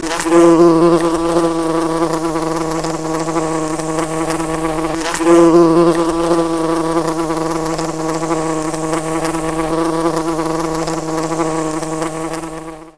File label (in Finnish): ampiainen